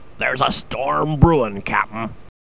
storm

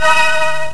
Fade; Blood Suckers; Arcade Games

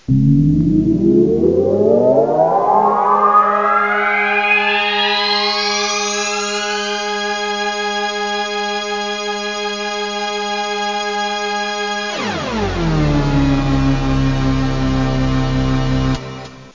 space elevator

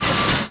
Scrape, sfx; urbanchaos

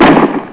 shot Sound

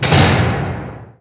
thud; Heretic II Demo

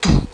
blow hi, voice; Web Clip Empire 150,000

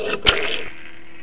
Spring; Bugs Bannis; Squish 2.6.8

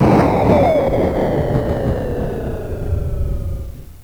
explosion; GMPlay; Drums

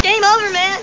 game over

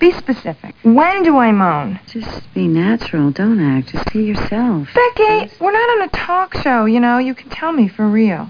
New moan

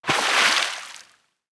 harvest fish; Immortal

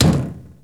hammer; wob2 demo

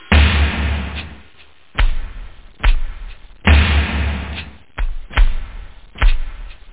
beat; OctaMED SoundStudio V1; house

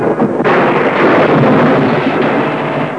electric thunder

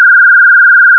New Level; FRAC 1.0

